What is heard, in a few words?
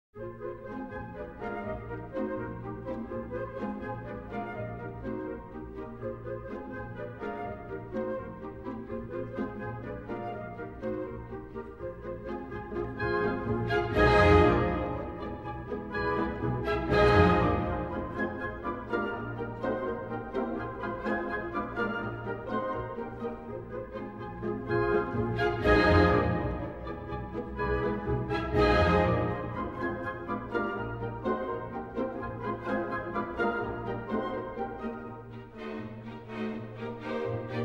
Symphony Orchestra; Carnaval Scènes Mignonnes Sur Quatre Notes, Op. 9